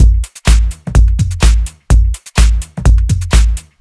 good sub bass tech kick loop; drum loops

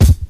original kick single shot D sharp key; kick drum samples

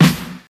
fluffy acoustic snare sound e key; 80s

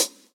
sharp hat sound B key; hat samples

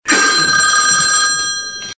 old telephone ring

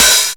low treble open hi hat b key

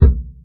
kick sample c sharp key, kick drum; kick drum samples

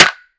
verby snare single hit F sharp key

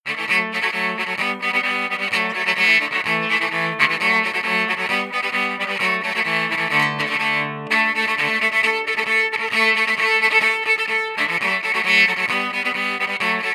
electric cello traditional DI sequence

